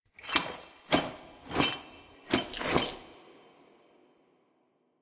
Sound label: sfx br anim walk rl windowlook in